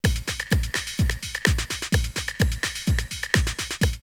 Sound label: Rum Shot 127, drumloops, Rave; X-Static Goldmine; 90 s Sample CDs